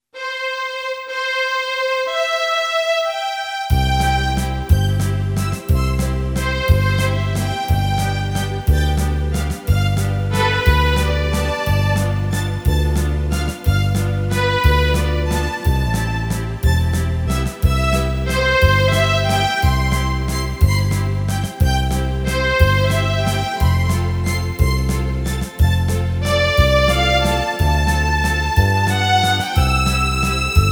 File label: Vienna Waltz, Yamaha PSR-550; Style